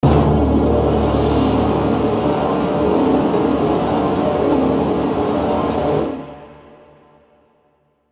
Orchestral Phrase; FXHome

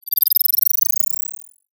Power Up, High Tech Interface Sounds; Lesser Vibes

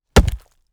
Log Hit hard With Hammer; Wood Impacts; Red Libraries

